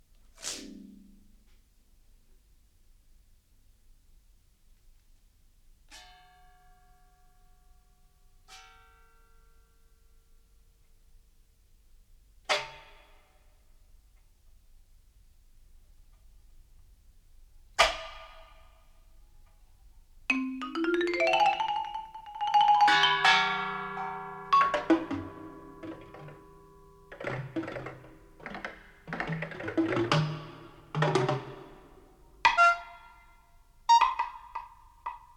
percussion item

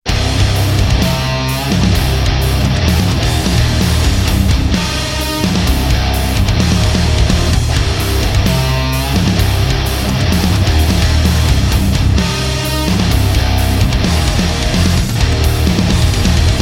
no hi guitar

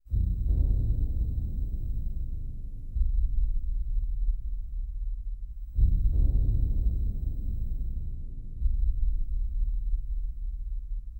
no choir

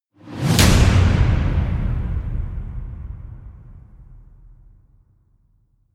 slams annihilate with whoosh

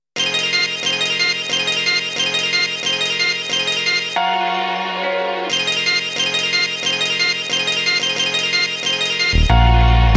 scratch dj mono instrumentale

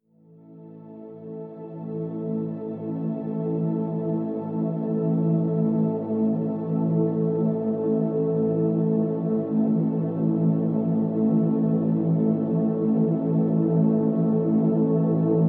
pads sublime

